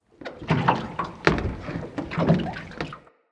Water Lap Creak Under Dock Slow Deep; Water Laps

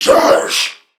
Surprised Crewmen; Warframe; CorpusCrewmen; CrewmanB

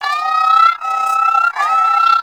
Armadillo Lure Call; Warframe; Characters; Hunting Critters

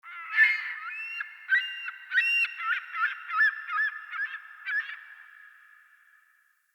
Beach Bird Distant; Midrath Beach

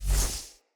Casting Device Block Loop Start; Weapons; Player

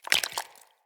Sap Drip Fall; Ambience; Props; Neathuns Root Cave

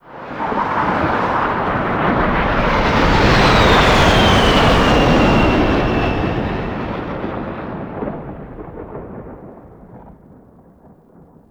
Plane Fly By; Hub; Warframe; Nineteen Ninety Nine

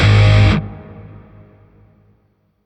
Shawzin Phrygian Chord H; Music; Warframe; Grineer; Chords

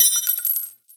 Belt Fed Rifle Links F; Warframe; Tenno; Frumentarius

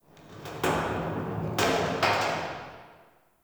Underground Metal Creak; Warframe; Nokko Colony; Ambience

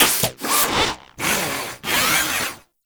Foundry Sleep To Work, Machines; Liset Machines; Tenno

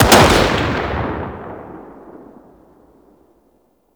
Sniper Shot 3D; Warframe; Tenno

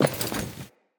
Walk Metal, Footsteps; Characters; Enemy; LadyDeora

